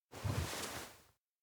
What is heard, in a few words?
peek girl; mitsu; anemoi